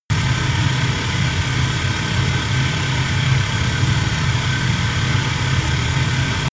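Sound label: spinning rattle deep, science fiction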